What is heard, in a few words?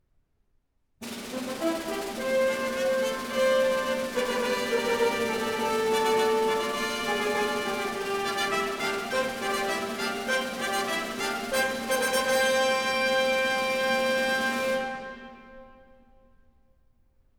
Fanfare; Shostakovich; DG; Incidental Music to the Tragedy 'King Lear' by W. Shakespeare, Op. 58a - Fanfare No. 5